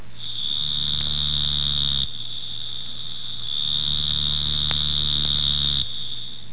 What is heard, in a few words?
marbled shovel-nose frog